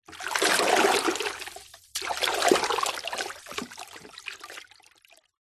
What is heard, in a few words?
household plastic bucket water shallow movements swirl; zapsplat